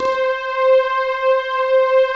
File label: PLUCK PDS; Pro-Rec - Synthsonic; TR PADS